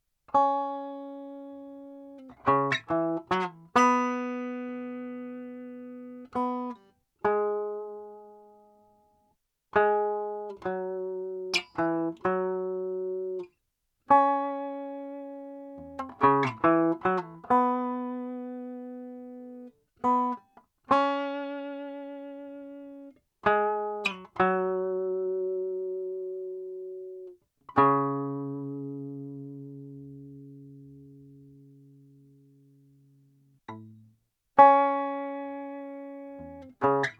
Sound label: Stinger Calm